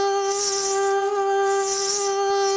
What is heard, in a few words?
buzz cart; vehicles